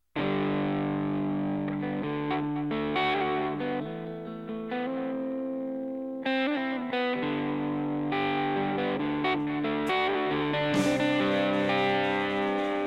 Cover Band

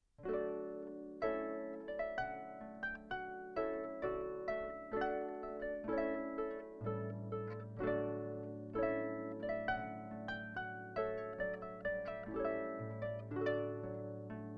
Trumpet Tune, Harp

